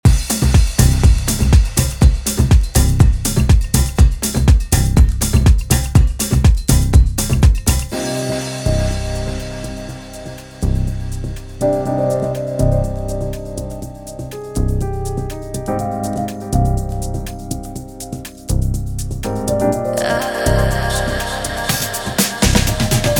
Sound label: Piano